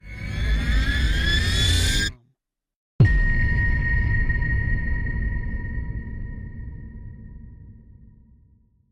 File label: cinematic rise hit; soundscrate